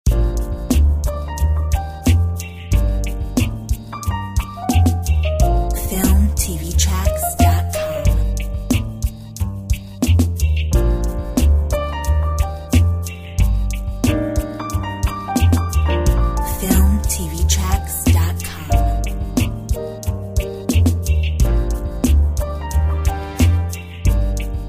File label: Hip hop jazz